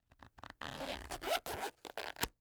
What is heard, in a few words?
Vinyl CD Case Zipper Open; AdobeCC